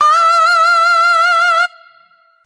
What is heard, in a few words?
"ah", SUNG; ooh ahh